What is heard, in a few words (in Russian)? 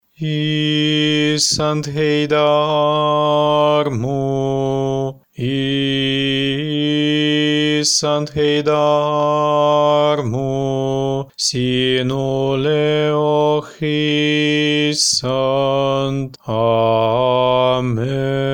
Малая ектиния на эстонском языке